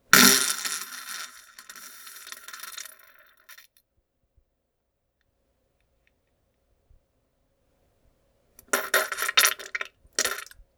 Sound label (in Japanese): ぶぶぅぅぅ ぶっぷ ぶりゅりゅ